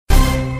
wild stop; Criss Cross 81; wazdan

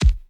reels stop; DinoReels81WD; wazdan

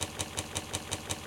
reels spin; Burning Reels; wazdan

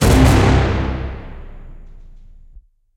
scatter win; Burning Reels; wazdan

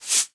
card distribution; Caribbean Beach Poker; wazdan

